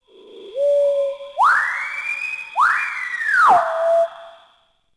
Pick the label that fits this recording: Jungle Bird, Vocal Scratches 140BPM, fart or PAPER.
Jungle Bird